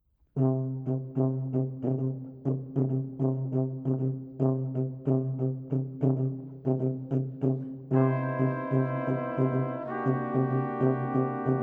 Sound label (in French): Passages pour quintette de cuivres